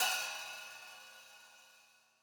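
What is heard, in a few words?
HI HAT OPEN OPEN HI HAT, analog recorded drum; Sonimus; s series drum pack; STONEQ 4K; SATSON; SATSON CS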